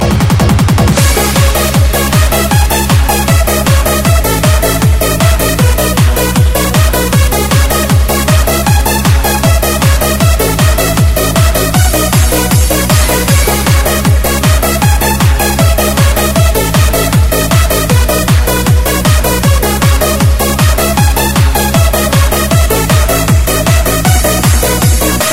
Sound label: Disco